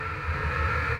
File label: centipede jump; fowl-play; elder-centipede; enemies